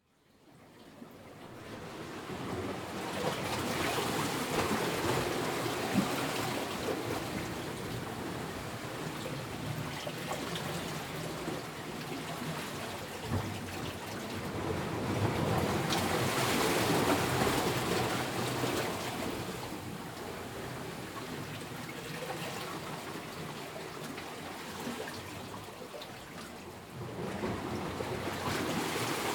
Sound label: Harbour waves in rocks binaural; Caloura Harbour